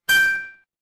ui alert

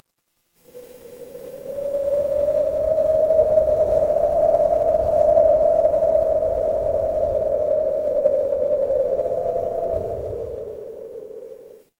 ambience wind

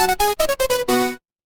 retro game game over

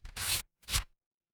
paper fabric tear

